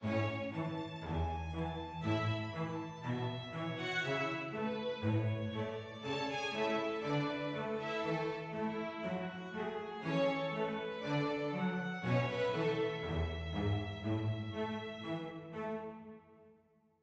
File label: happy med; Quivr; PS4 Windows gamerip 2016